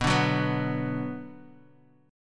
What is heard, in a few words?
B Minor B Guitar; strummed; JGuitar